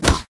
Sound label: dragons enemy lava tanky attack; sfx